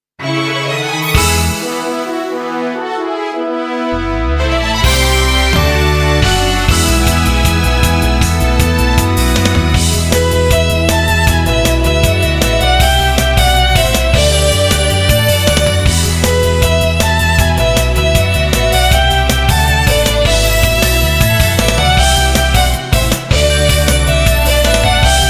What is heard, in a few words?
comical